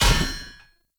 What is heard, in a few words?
Sphere Polearm Medium Parry Impact; Weapon Impacts; Player; Combat